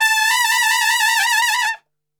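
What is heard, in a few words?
A trumpet shake; Best Service; Brass Super Section; TRP SHAKE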